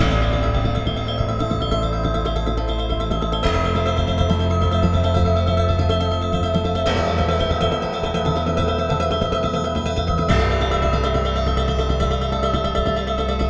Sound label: Gamelan techno Slow; rive wreck hack die retry; ps4